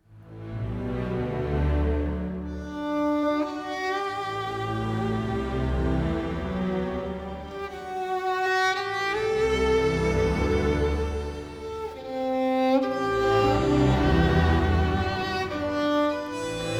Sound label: Tango